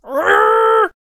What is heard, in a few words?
Attack Vocals